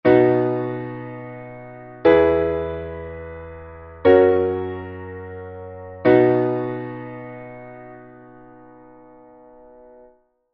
B major three chord

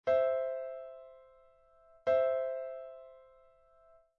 C chord

